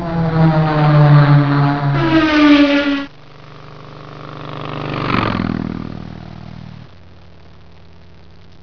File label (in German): Ton Motor, Feldbahn; Riedlhuette; Anfahrtsbeschreibung